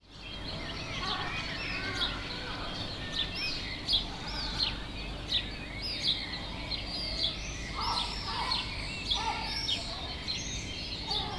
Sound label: seagulls